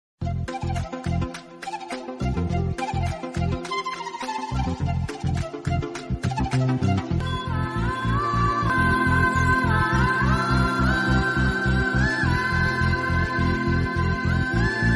Telugu Love Beats Telugu Ringtone

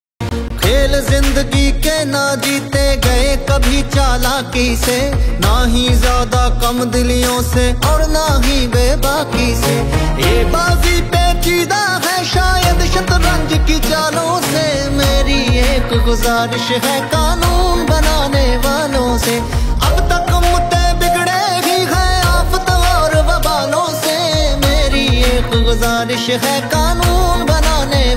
Desi Punjabi Ringtone

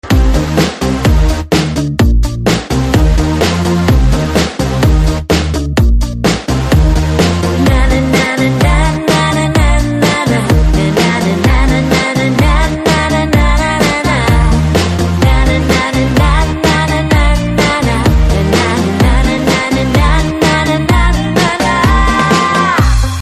BG Humming Telugu Ringtone

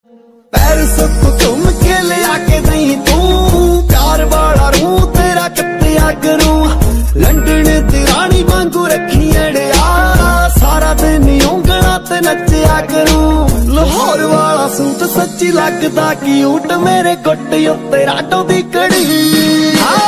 Punjabi Beats Ringtone

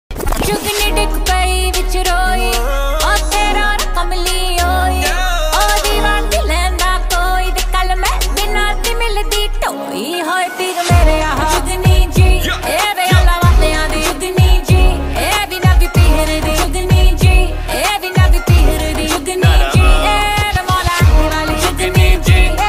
Punjabi Beats

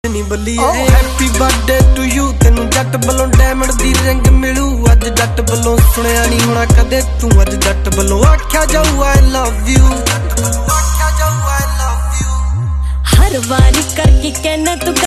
Happy Birthday To You Ringtone

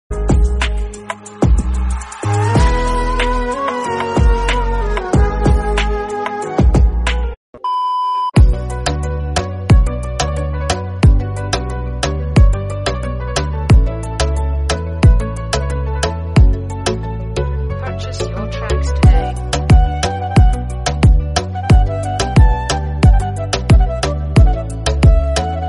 Instrumental Instrumental Bgm Ringtone

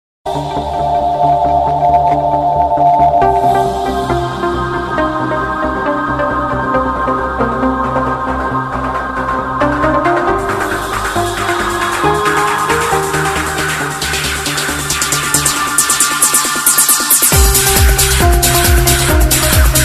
Techno Remix Ringtone

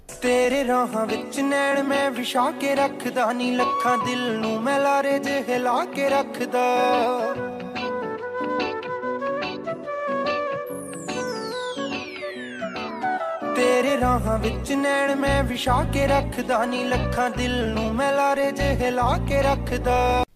Jatt Punjabi Ringtone

